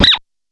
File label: BEEP; VOICES